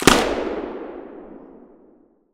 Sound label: shoot, crossbow; Minecraft; create-astral